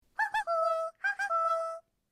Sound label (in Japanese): カッコウの鳴き声; 杜鵑的鳴叫; 声マネキング; 声マネキング対決; フブみこさん; ホロライブ; さくらみこ